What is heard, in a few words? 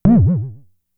FX, Drum Machines; Fricke Schlagzwerg